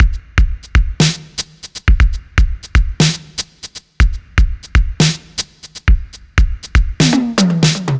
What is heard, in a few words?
Half Time Dub SP1200, 120